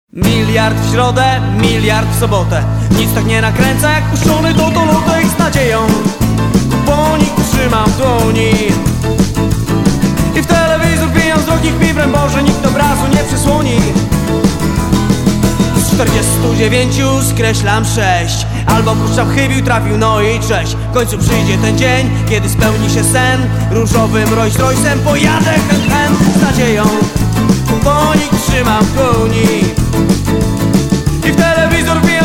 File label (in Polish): Loteryjny rock n roll